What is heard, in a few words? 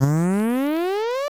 flagpole; marioroyale; allstarssmb